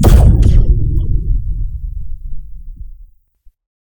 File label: teleporter; Risk of Rain